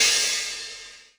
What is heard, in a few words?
Crash; Equipped Music; Loungin House